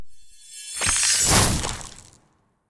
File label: Ice Thorn; Ice Thorn All1